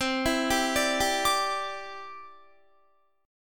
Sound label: C Major Add 9th, Guitar; Cadd9 arpeggiated